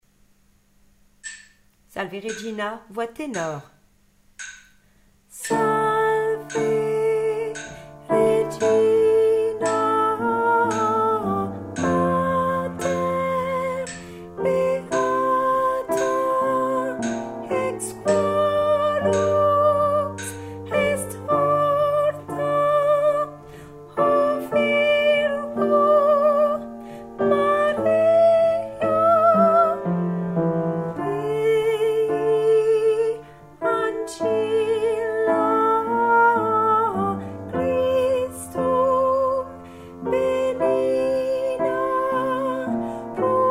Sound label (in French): salve regina tenor